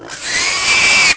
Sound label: roar